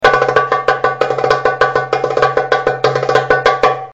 tabla son

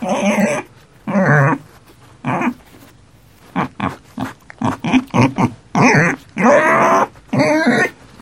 porcelet cri

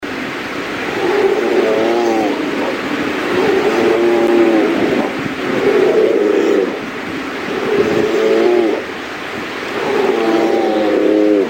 grizzli grizzly cri, cris d animaux

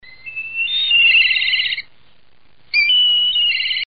milan cri, cris d animaux

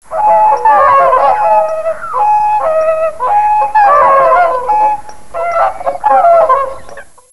cygne cri, cris d animaux

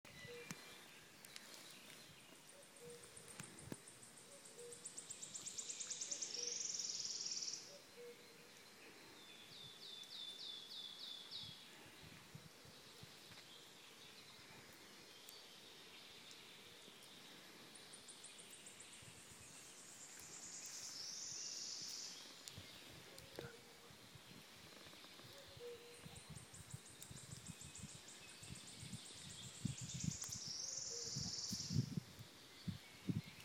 Pouillot siffleur; iphone

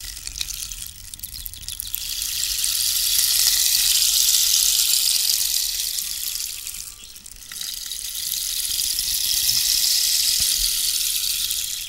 baton de pluie son

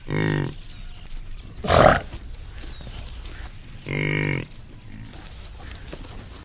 bison cri

